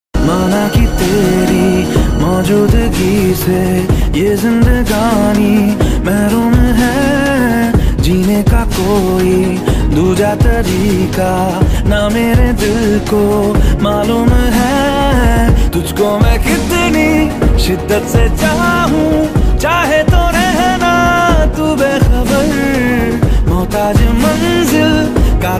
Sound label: Ringtone